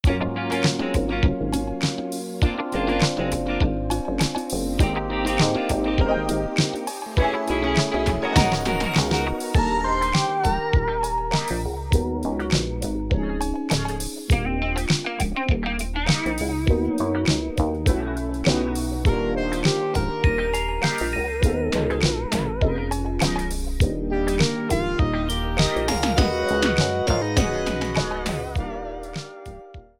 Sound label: Electro Arrangement